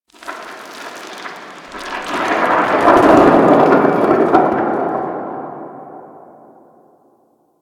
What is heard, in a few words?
Rock Fall Cliff Distant